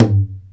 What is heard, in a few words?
MADAL, ETHNIC PERC; InVision Interactive; Lightware VOL-1